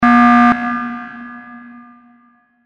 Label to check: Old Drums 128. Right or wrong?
Wrong